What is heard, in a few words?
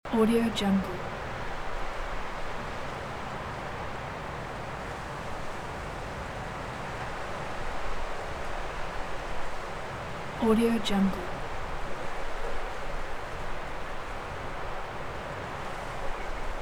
storm wind at sea beach loop